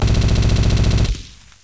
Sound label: war, war zone